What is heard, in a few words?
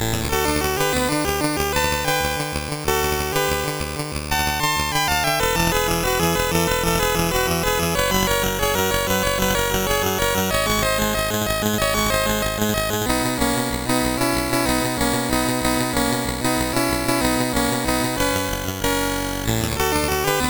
sid MOS6581R4; Flying Shark